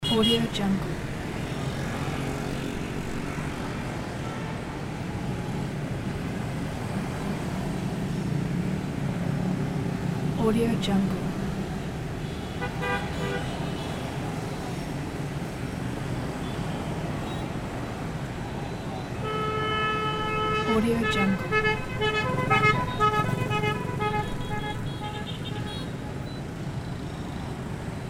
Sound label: busy city traffic with many honks